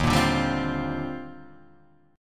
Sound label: D sharp Major 13th D sharp Guitar DADGAD, chord; chordshape